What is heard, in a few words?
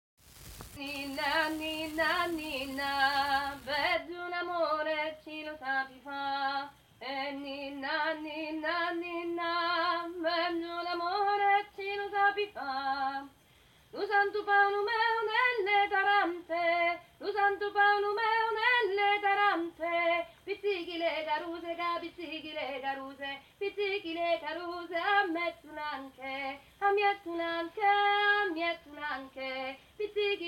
pizzica